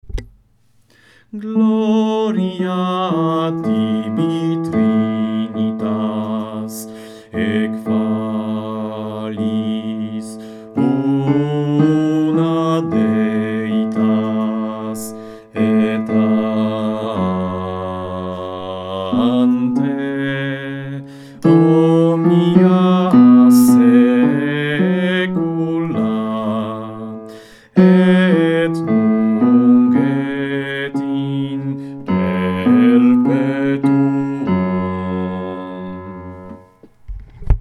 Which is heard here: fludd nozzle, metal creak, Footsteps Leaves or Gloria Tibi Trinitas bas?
Gloria Tibi Trinitas bas